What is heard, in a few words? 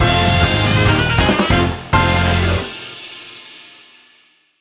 Jingle Goal